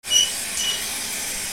BATHROOM

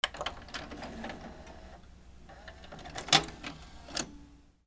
HOUSE

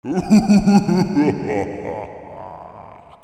CARTOON

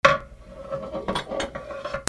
KITCHEN

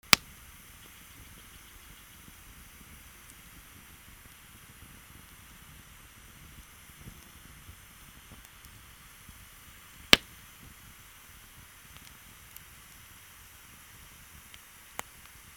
AMBIENCE

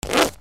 PAPER

